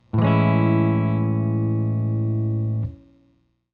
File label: Guitar Chord